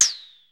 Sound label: chip, bells noises; AMG - Vince Clarke Lucky Bastard